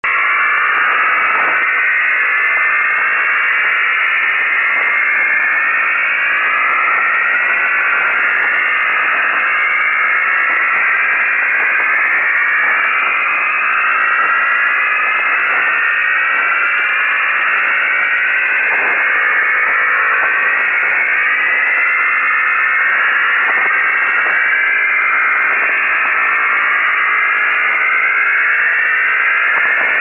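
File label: Static Higher Grinding, static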